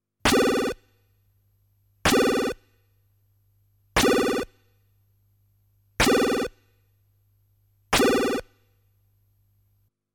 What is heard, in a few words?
Player Recieves Damage